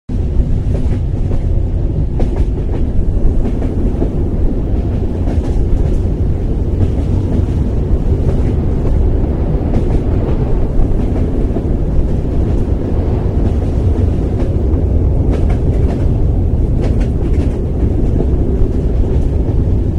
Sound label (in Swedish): tåg